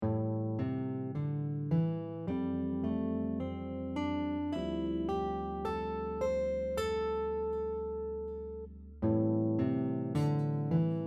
Pentatonic example, guitar

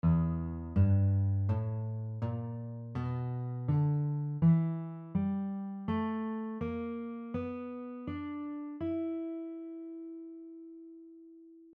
E minor blues scale, guitar; The E minor blues scale has the notes E, G, A, A#, B, and D

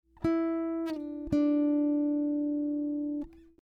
shift slide down, guitar; slides on guitar

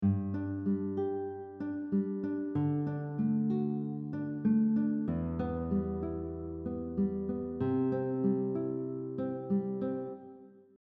Fingerpicking pattern mixing directions 2, guitar; we switch the direction on the 2nd and 3rd note but keep the pattern the same as the previous example

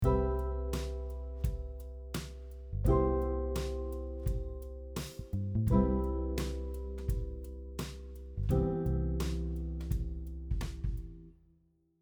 Drop 2 chord, guitar; how to play drop 2 chords on guitar

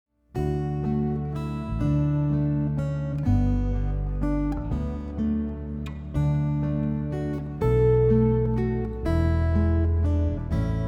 guitar arrangement; Tis So Sweet to Trust in Jesus

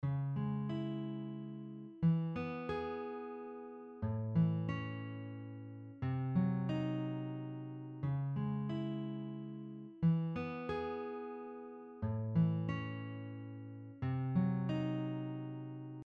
Spread triad, guitar; Spread triad chord example 9

